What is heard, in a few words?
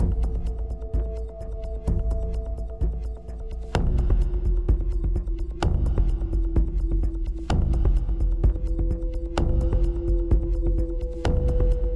Waiting To Drop; apex legends season 12; Ash